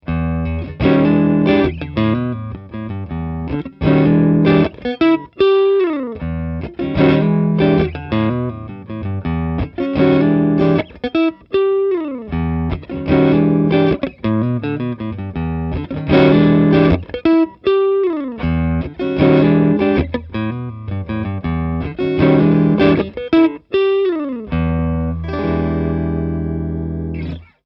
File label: Multimic Facets Elec Gtr1 Right SM57